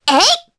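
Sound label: Vox Attack Japanese; Requina; kingsraid audio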